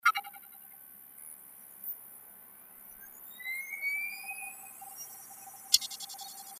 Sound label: startup, hdd noise; sp1614n